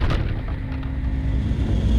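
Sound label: Music Volcano 120; From Dust; PS3; Xbox 360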